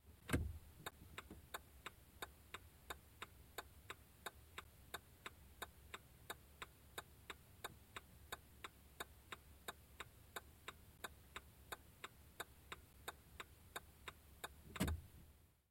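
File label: car turn signal sounds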